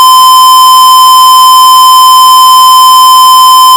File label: sine bad; TLV320AIC3100